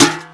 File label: paint bucket drop